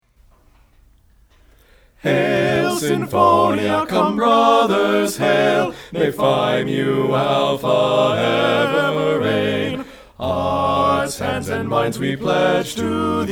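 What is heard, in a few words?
Hail Sinfonia Baritone soft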